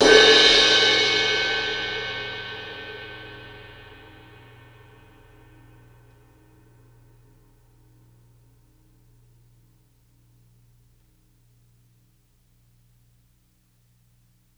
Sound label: sus cymbal crash with stick